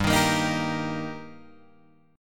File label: G Minor Sharp 5th G Guitar Open G, chord